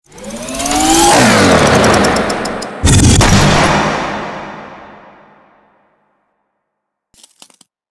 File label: throttle break